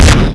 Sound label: Hit Short; PC Gamer IT CD 48; Big bang